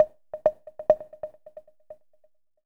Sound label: prc, Hip Hop & RnB; ProSamples vol.34 - Hip Hop & RnB 3; Where U At